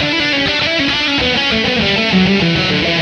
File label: lead, 100